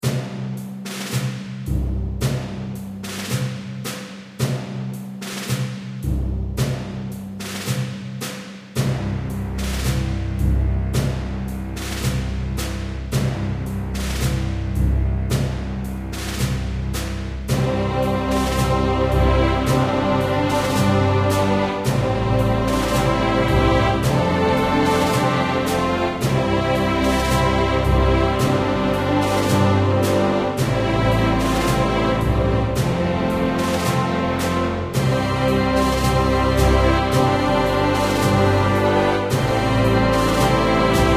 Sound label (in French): hymne instru franconie; reflets d acide